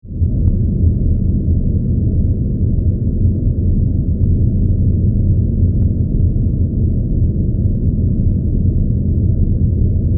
Spaceship Interior, Spaceship, Interior, Rumble, Drone